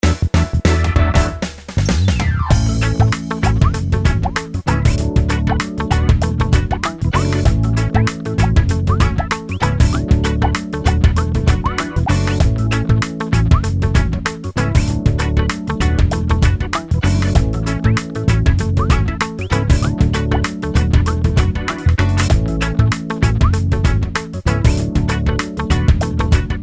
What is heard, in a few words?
music menu; Teeworlds